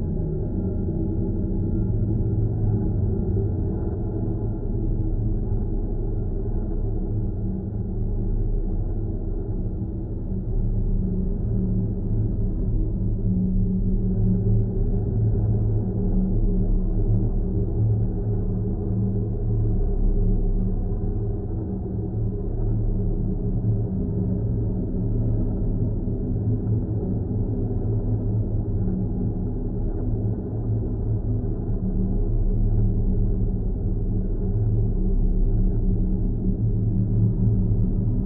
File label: alien tech; The Swapper